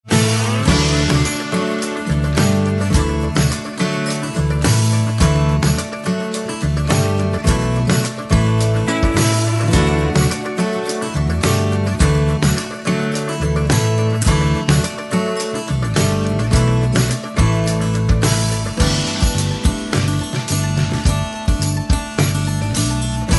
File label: country track